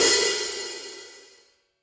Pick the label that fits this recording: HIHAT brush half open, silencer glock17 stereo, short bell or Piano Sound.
HIHAT brush half open